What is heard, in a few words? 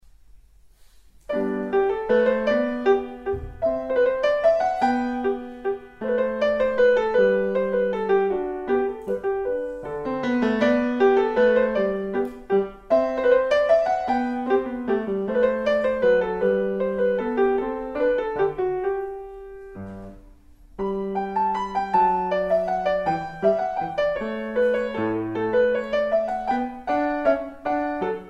Bach BWV 114 Menuet in G